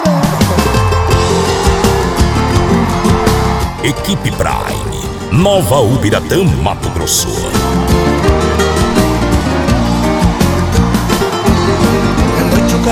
moda de viola; frequency mix

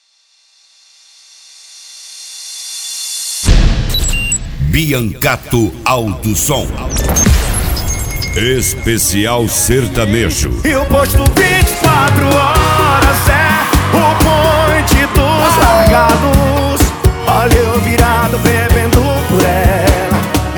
sertanejo universitario; biancato auto som

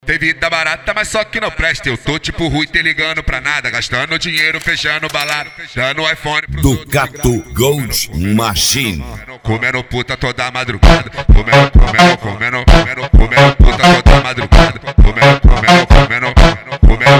especial funk

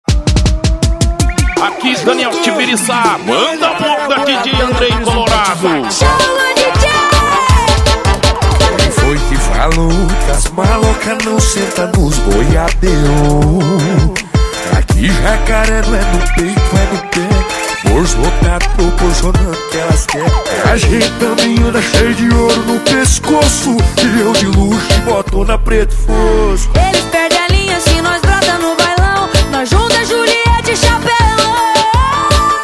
sertanejo com locutores de rodeio

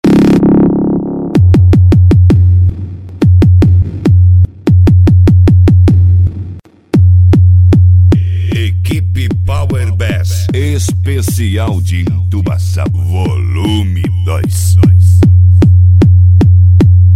entubacao

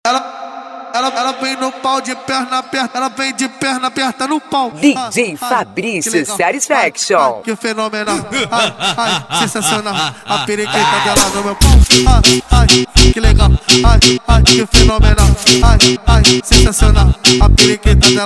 carretinha mamba negra esp funk ritmado; jr auto som

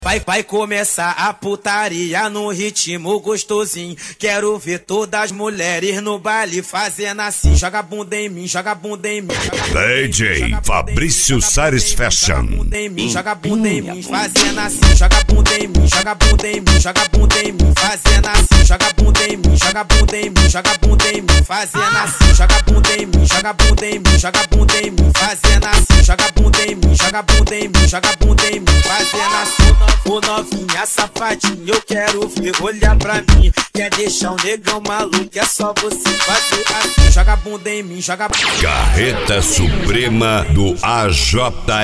carreta suprema funk

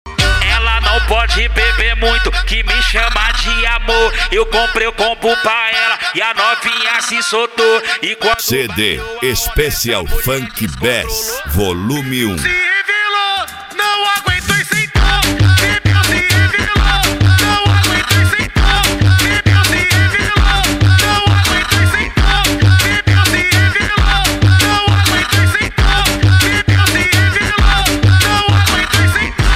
cd especial funk bass

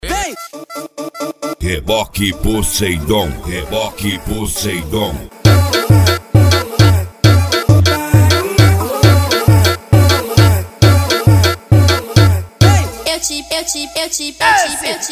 sound car; reboque poseidon sartori

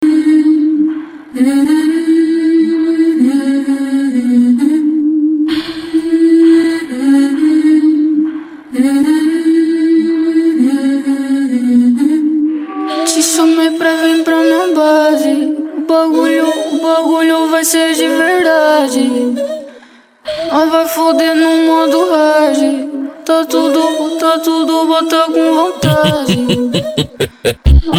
funk consciente e funk ritmado

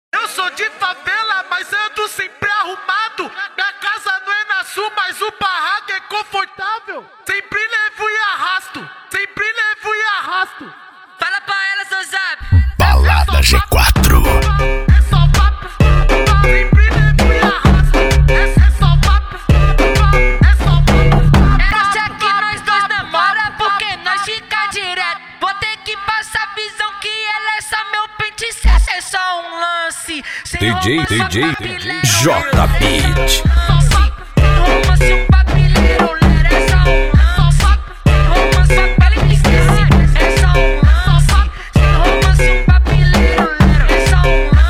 mega funk

